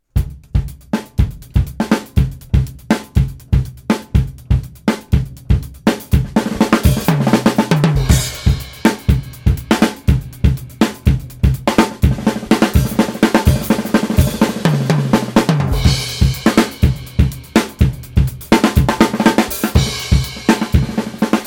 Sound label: Drumkit